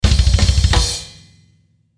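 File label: Musical Element, Drum Snare March; Blastwave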